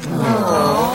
groan of many

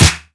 sharp snare one shot f key; snare drum samples

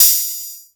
prominent open high hat sample g key; open hat samples

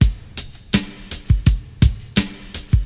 83 bpm drum beat g key; drum loop

